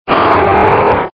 Grito de Latios

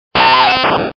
Grito de Weedle

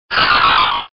Grito de Snorunt

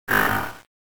Grito de Poochyena

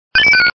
Grito de Wooper; Actualizando grito en la sexta generación (XY)

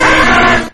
Grito; Charmander

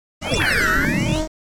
Grito de Rotom

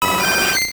Grito

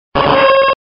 Grito; Ariados

